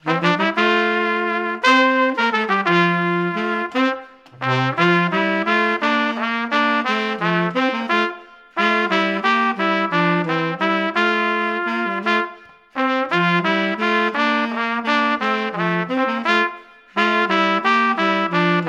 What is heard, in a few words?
duet